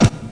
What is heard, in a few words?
AR SOFT; PC Action 1999 February (Bonus); Dark; thief